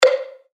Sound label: C, percussion; revealed percussion vol 1